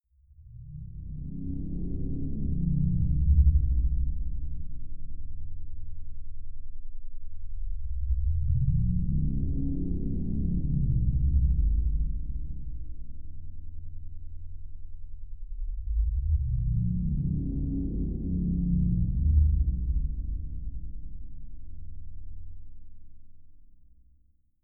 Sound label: space huge ship signal, drones